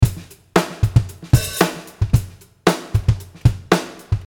Drum

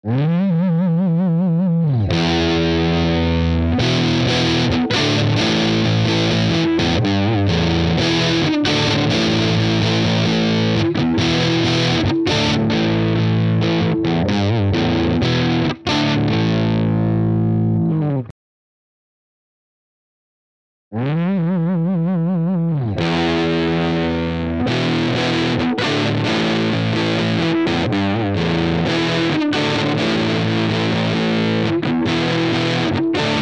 E Guitar; Brimar H4 9K

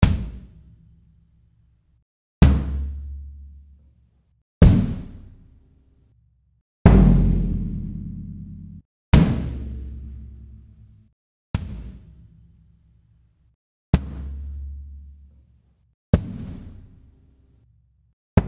Kicks; 76DrumSlam

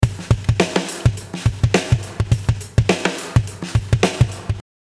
Bright Drum Parallel

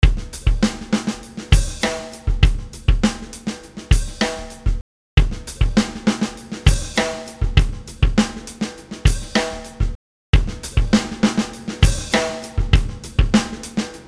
Drum, Mullard Long Plate

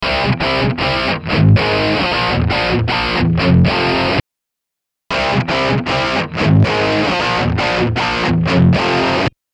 E Guitar; Mullard Long Plate Low Bias

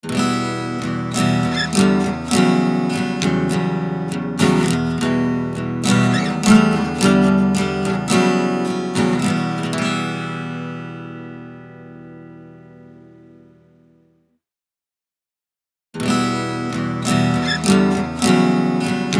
Ac Gtr; Brimar

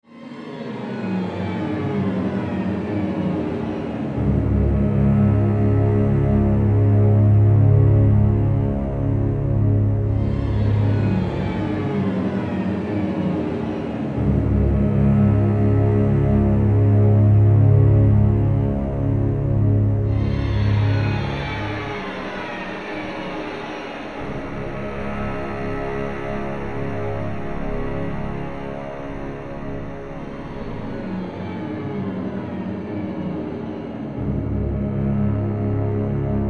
string

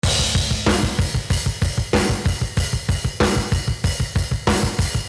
Drum After Nuke

